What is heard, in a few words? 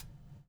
BUBL, BUBBLE; Spectrasonics - Backbeat